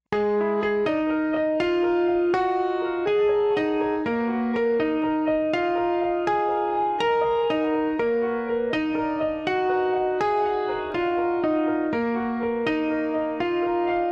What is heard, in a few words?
Piano